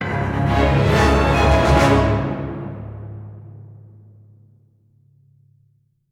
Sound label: Revive Music; Zombie